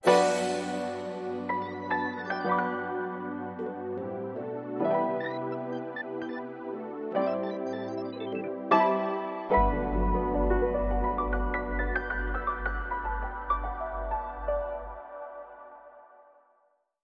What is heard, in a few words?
Soft and Slow